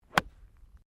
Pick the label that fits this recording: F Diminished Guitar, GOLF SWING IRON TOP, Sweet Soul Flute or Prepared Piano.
GOLF SWING IRON TOP